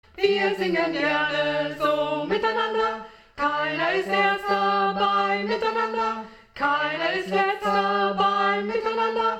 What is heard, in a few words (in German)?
a capella; Kinderlieder